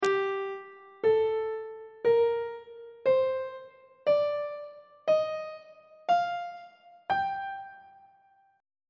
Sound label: f double sharp melodic minor scale classical descending; on treble clef